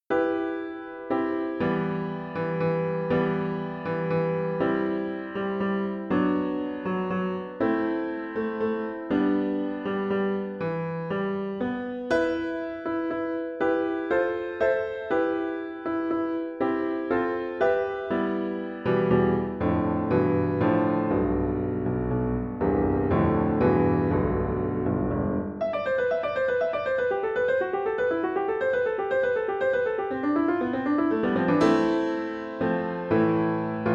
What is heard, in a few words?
Soliloquy for Piano